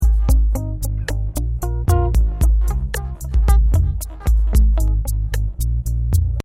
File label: loop